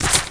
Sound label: disguiser attack